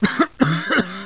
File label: cough